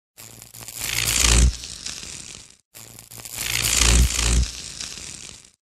Electric pulse; پالس الکتریکی

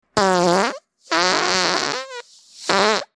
fart

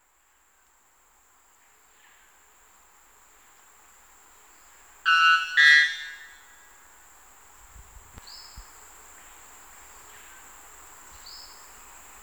white bellbird; پرنده بلبرد شکم سفید

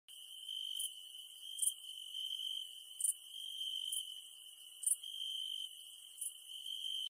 Birds at night; پرندگان در شب

